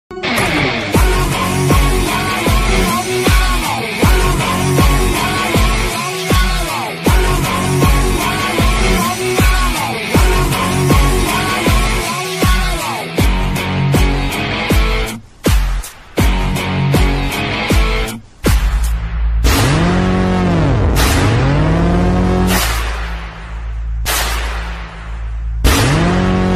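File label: Mass Full background music Ringtone; Kabir Singh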